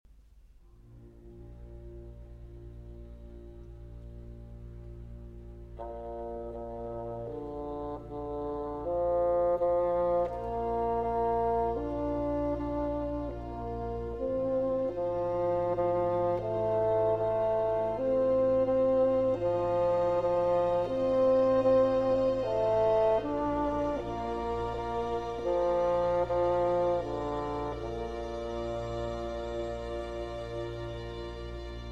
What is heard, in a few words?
Bassoon Concerto in B Flat Major; Bassoon Concerto No. 1; La Notte, RV 501